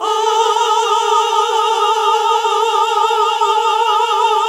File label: GOSP. AAAHH